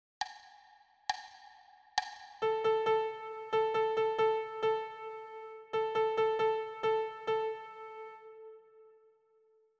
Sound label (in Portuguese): Ditado ritmico 34